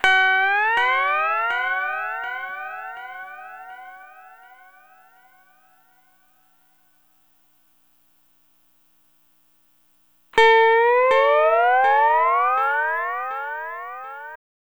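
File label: Sunrise Guitar Fx E, Lounge; Zero-G Kocktail Kollection; Construction Kitz (Lounge); Sunrise Mixes 082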